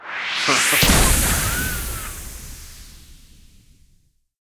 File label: Respin Anim; alohaxmas